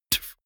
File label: beatbox bass drum dull